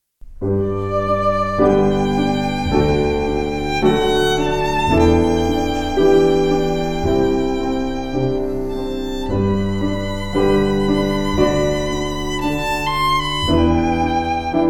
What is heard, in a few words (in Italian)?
tenore